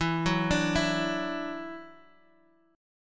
E 7th Suspended 2nd, chord

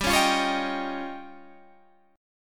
F 7th Suspended 2nd Sharp 5th

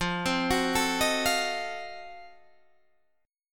F 7th Flat 9th F down Guitar Standard; F7b9 arpeggiated

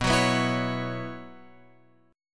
B Suspended 2nd Suspended 4th B, chord; Bsus2sus4; {7 9 9 9 X 9} strummed

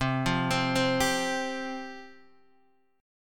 C Suspended 4th C, Guitar, Open D

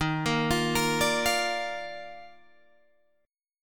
D sharp Major 7th Suspended 2nd, Guitar, Open G

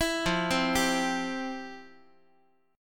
F Major 7th Suspended 2nd F, Guitar; arpeggiated; JGuitar

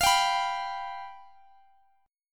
F Suspended 2nd Flat 5th, F, Guitar, Standard; strummed; Fsus2b5; x,x,x,22,20,19

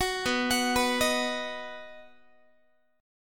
B Major B, Guitar; arpeggiated; 4A,4E,5A,5D,5G#,6C#; 21,19,21,21,19,x

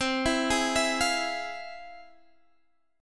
F Minor Major 7th C, guitar chord

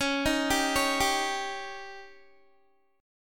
Db Suspended 2nd Flat 5th Db down Guitar; arpeggiated

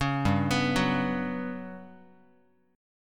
G Major 7th Flat 5th, Guitar; Listen to GM7b5 arpeggiated; GM7b5 Chord

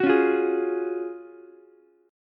F sharp Major 7th Suspended 2nd, Ukulele; strummed; JGuitar

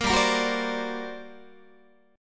G Minor Major 11th G down Guitar, GmM11 chord; GmM11 strummed; JGuitar

